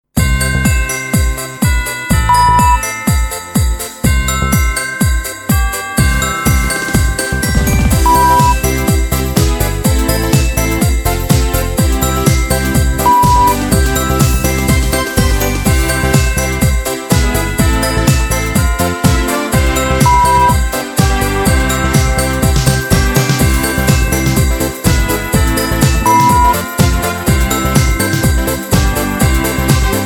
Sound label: XMas 80 Dance 124